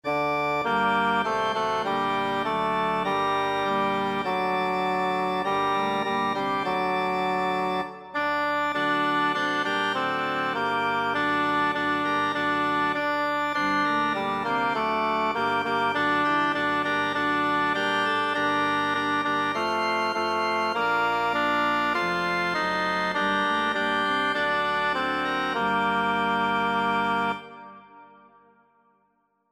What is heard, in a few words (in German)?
tenor; s isch aeben e moensch uf aerde